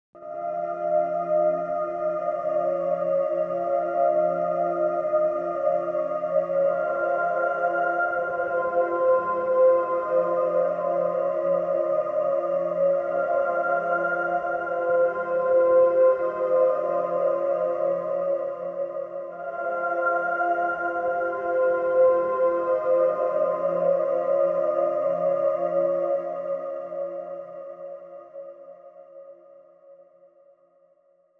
intertwine Fm, pad

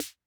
Snare, rhythm king; classic machines

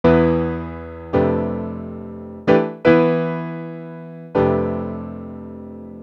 D&B Keys Riff; neuro

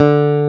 E BASS D2, Bass Guitar, STACK BASS; AKAI S6000 CD-ROM - Volume 1

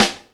SD, Dance Drums; Best Service ProSamples vol.15; AKAI

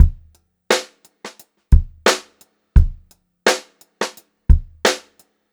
87 STR BEAT, Funk-Groove Drumloops; USB Soundscan vol.38; AKAI

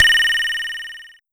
Pollard Syndrum

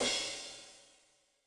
Crash, Cymbal, Yamaha DTXpress-II

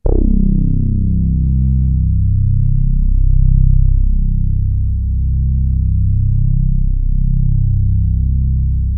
MOGUE BAS, KURZWEIL K2000

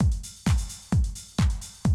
130, Classic DrumLoops

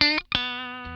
lick scale, funk guitar; Zero G - Funk Guitar

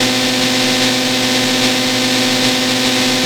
FX, Futurist Drum & Bass

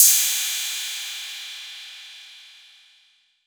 PERC 808

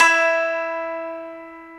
Su Gzheng E3; Planet Earth; Digital Sound Factory Planet Earth

